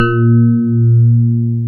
PIA DX E-PIANOS

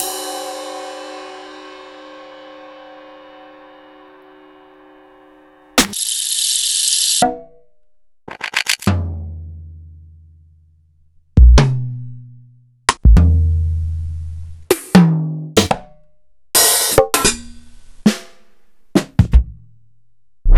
EMU PX-7; m8

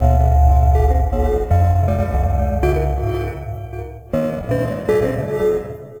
Melody, IDM

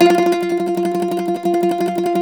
MANDOLIN

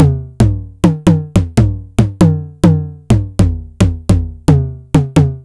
Roland Tr-909 mid tom

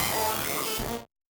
Malfo Syn, malfunctioning synth

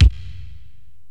Korg x5, Drums

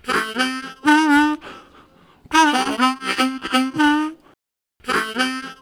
Low Spacer, Harmonica Riffs; Zero G

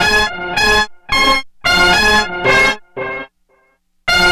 Horns; Sonic Foundry; Stylus Pressure Urban Grooves on Digital Wax; Honk Diggity

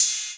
ELE RIDE, Drums Dance & Groove; USB Soundscan vol.11; AKAI; ELEC. KIT

